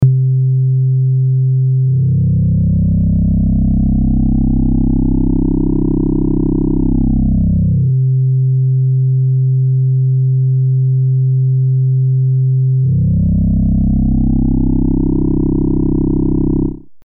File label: Korg NTS 1 VPM